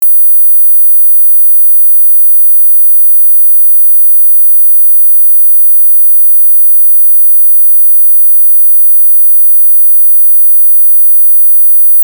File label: 19khz